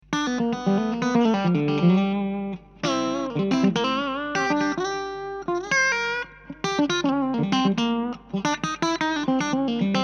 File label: vox gain